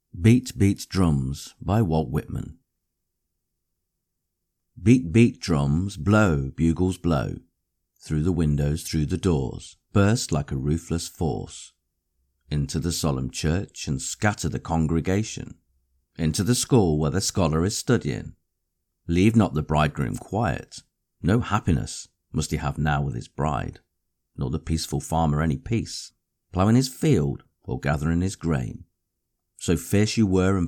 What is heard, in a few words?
beat beat drums